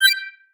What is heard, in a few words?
reply; epicyon